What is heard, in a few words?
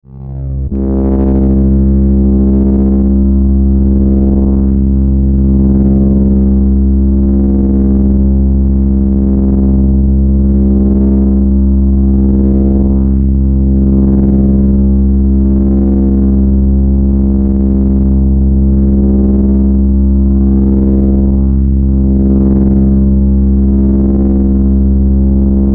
Bed Synth Electrical Hum Vibrato; Bed Sound Effects; Blastwave; Production Element Imaging Element Accent Transition